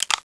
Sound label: m72 unlatch, weapons